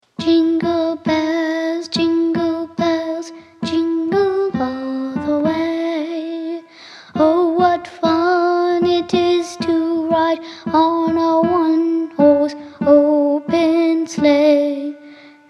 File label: Jingle Bells